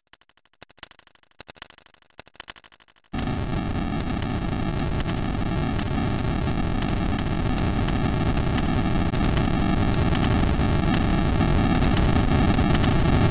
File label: burning house sound; final fantasy vi; ff3; ff3us